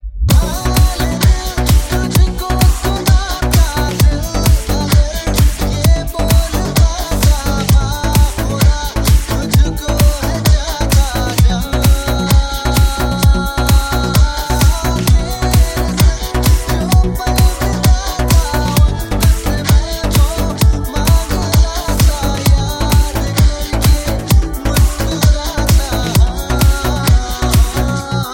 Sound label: Bass Mix